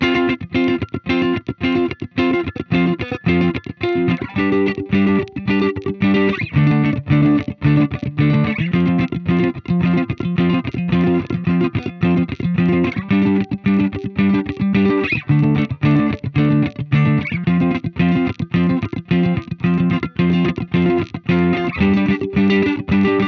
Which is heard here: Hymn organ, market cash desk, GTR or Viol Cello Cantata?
GTR